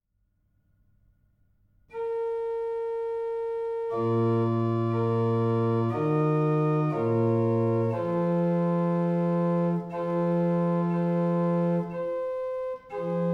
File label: High Velocity, organ; duurswoude; Schuetz